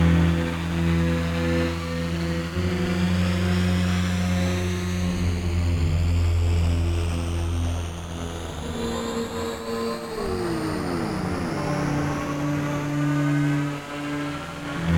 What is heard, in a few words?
drone tonal enigmatic saw shepard tone pitch rising; sonniss gdc